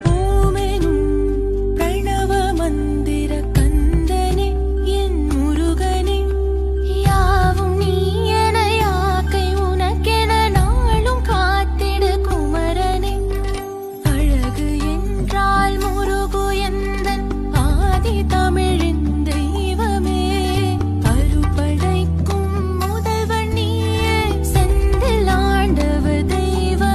SONG RINGTONE, TAMIL

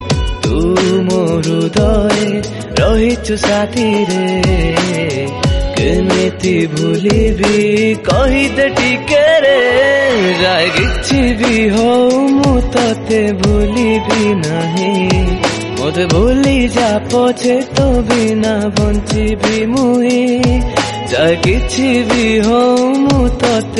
ODIA RAP

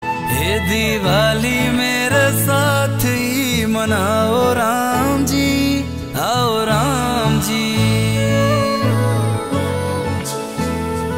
RINGTONE, BHAJAN

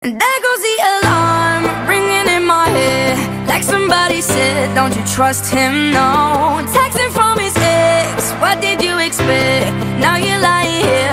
RINGTONE

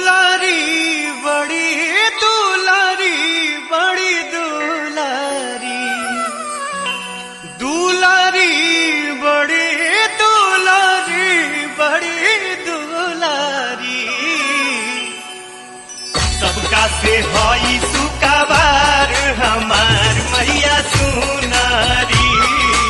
BHOJPURI BHAKTI RINGTONE

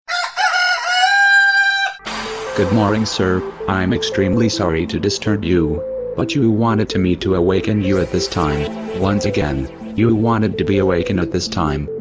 WAKE UP ALARM RINGTONE

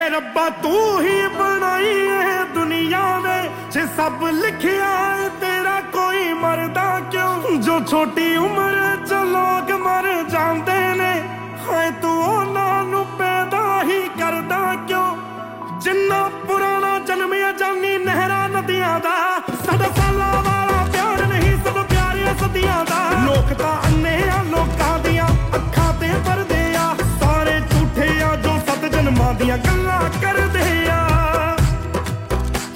SAD RINGTONE

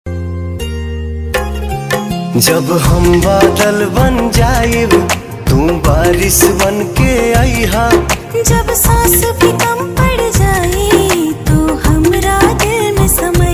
BHOJPURI RINGTONE

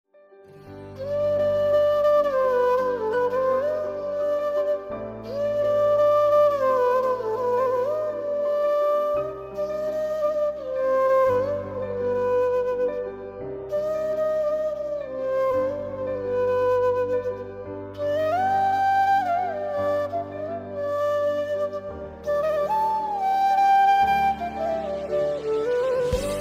FLUTE

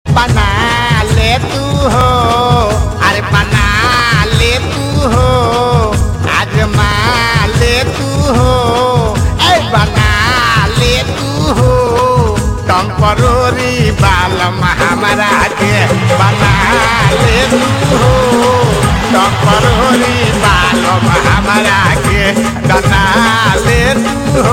BHOJPURI RINGTONE

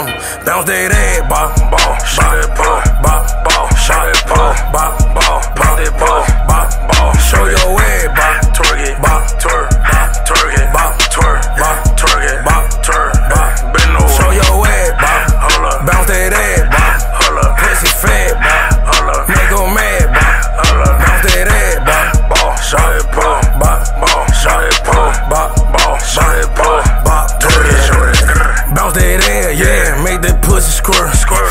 RINGTONE, HIP HOP

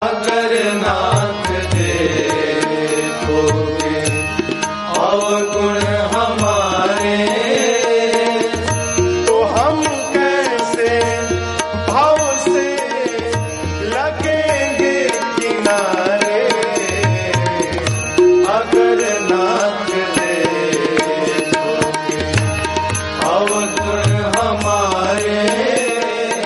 HINDI BHAKTI BHAJAN RINGTONE

